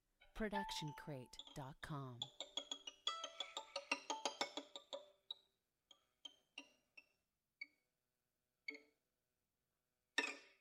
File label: Violin Scary Movie; SoundsCrate